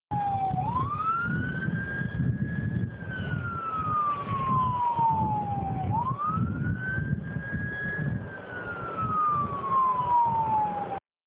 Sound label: suction vortex